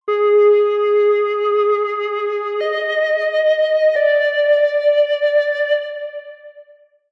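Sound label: science fiction 50s style synthesized accent aliens weird theremin; zapsplat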